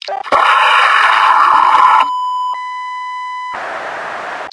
fire scream, metropolice; hl2

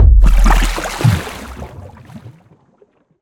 designed water impact; BluezoneCorp

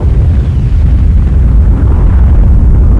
suction, incinerator; hl2; citadel

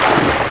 water chop; hl2; nature

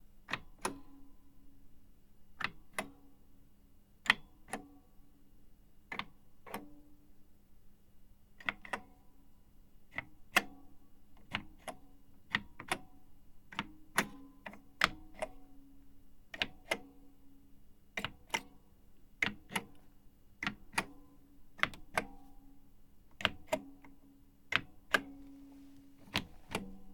Cash Register SANYO ECR 335 UI Button; The Soundcatcher

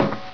footsteps metal; hl2

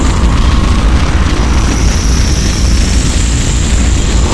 machine whine, incinerator; hl2; citadel

